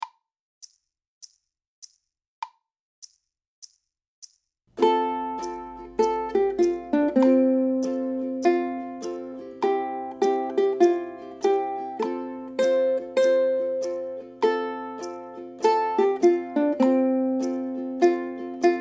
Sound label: Go Tell Melody, ukulele